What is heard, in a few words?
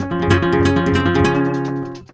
Guitar, Flamenco, c; Best Service ProSamples vol.46 - Flamenco; Frase